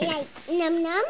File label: Toddler Numnum